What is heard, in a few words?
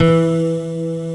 nylon, JD8 synth